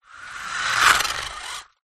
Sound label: leisure radio controlled car pass; zapsplat